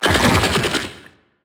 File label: vocal; hollow